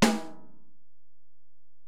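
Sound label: Acoustic Snare, Alesis DM-PRO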